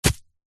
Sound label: wood steak sharp post drive by hand into dirt through thick grass; foley